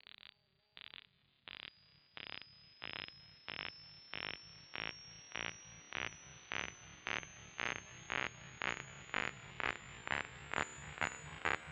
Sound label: 138BPM CrazyFx; Sound FX; G#minor; Vengeance Samples; Manhattan Kit